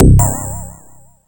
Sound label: pluk